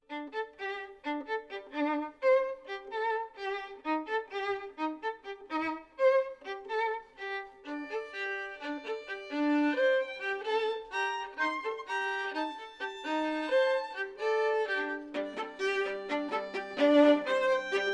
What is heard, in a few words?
Quartet Fugue